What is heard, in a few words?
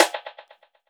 fuser, Percussion Ticks; Misc Percussion